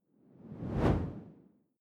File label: whoosh simple low; swooshes-swishes